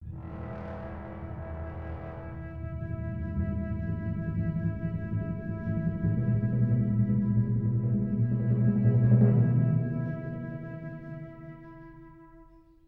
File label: Restaurant - Combo Host Pad; Bee Movie Game